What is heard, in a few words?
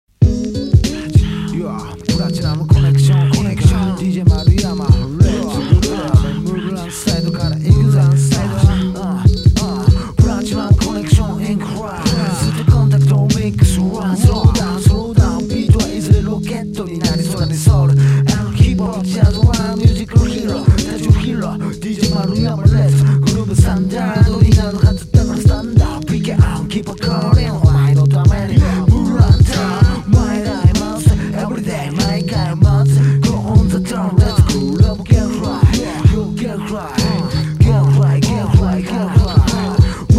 groove